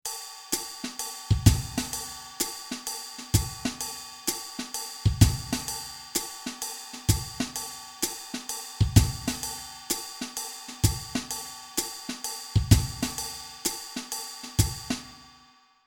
Jazz

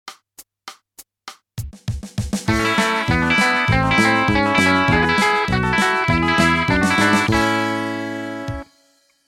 country

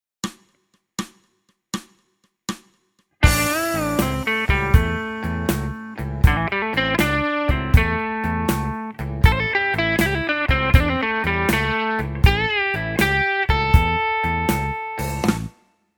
blues

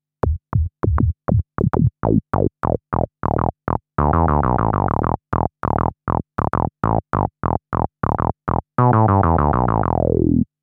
Saw EG, synthe

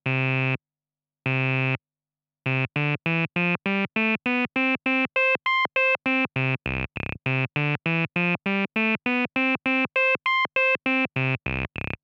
Saw Reso

